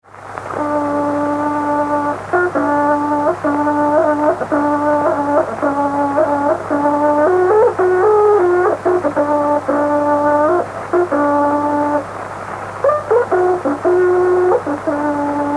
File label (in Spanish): Toque de trutruka; Puede ser en Boroa, Collico o Dollinco; Música Mapuche